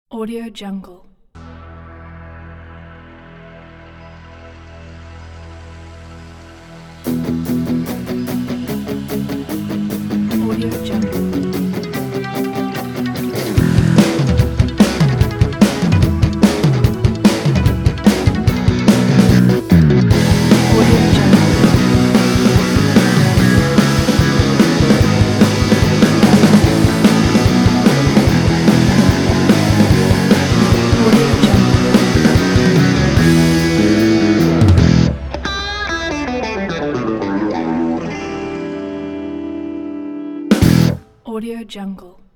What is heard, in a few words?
tv show intro